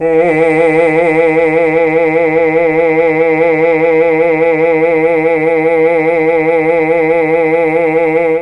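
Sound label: E2 chamber male, mellotron; CHMB MALE VC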